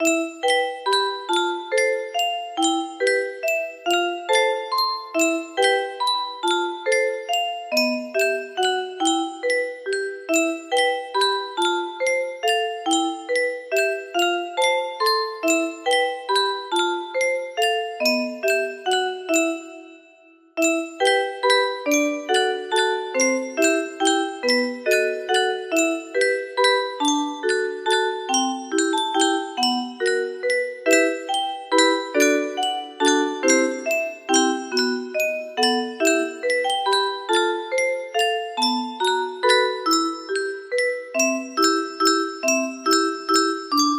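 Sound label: music box melody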